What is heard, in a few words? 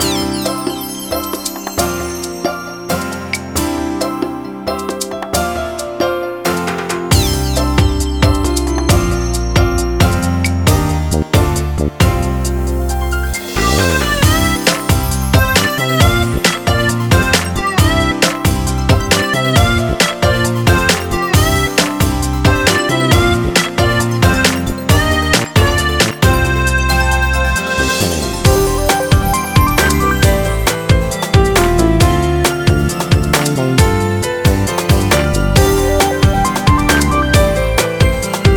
Music English Classic Countryside; Let's Golf 2 HD